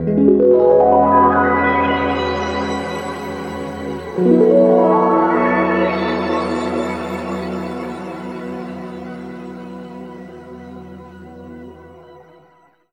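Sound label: E+D pitch shift wah, Guitar Samples; East West; Steve Stevens Guitar Samples Collection; PTCH-SHFT+WA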